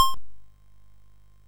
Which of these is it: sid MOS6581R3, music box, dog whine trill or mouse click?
sid MOS6581R3